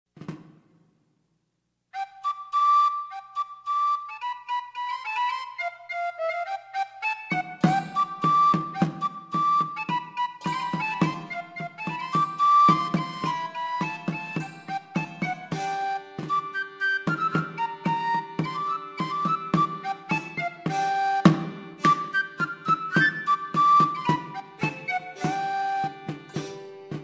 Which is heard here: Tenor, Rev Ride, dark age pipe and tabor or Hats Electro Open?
dark age pipe and tabor